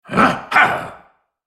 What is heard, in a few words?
spell cast effort; Skarner; en US